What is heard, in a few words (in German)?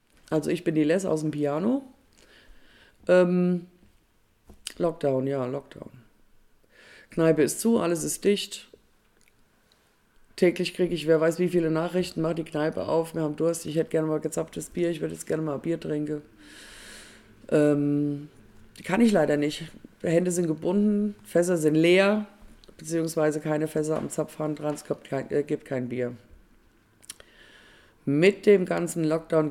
statement piano; hungen corona fotoprojekt alles auf stopp